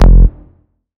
Bass F, Bass Synth

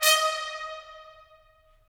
BRS, Tps Stacc; Roland - LA Composer vol. 4

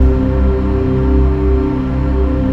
hoover C2; Best Service Dream Experience

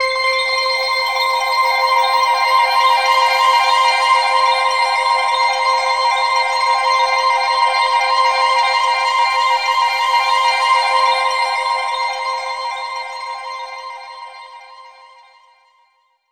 synth, c, Chillout Synth Atmos

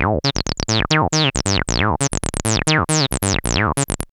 BASS, TECNO; AMG - Vince Clarke Lucky Bastard

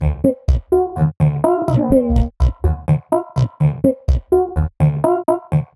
Rhythmic; Sonic Foundry (Sony Creative Software); Underground UK House & Electro

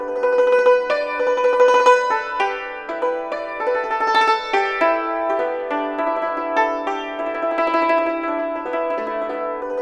YNCHIN 100 G; INIS - Opium

